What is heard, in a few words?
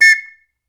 2 NOTE WH, World Whistles